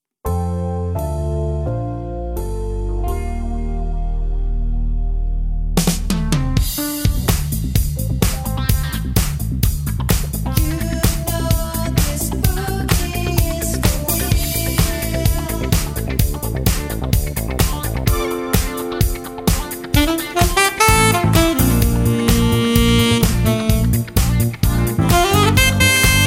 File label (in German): Saxofon